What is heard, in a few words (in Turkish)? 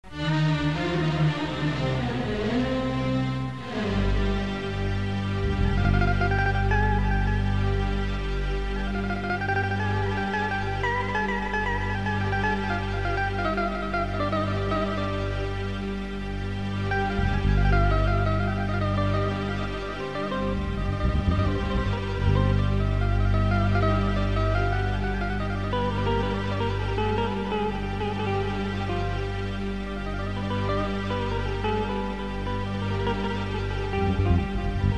ketron fasulye, sx 3000 ritim intro